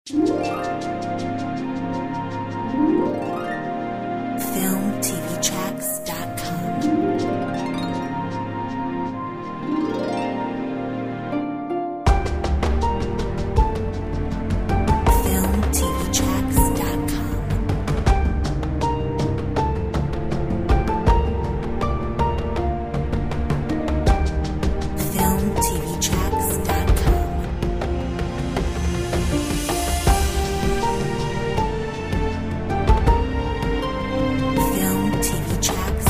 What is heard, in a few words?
My secret love Orch drums; Filmtv-tracks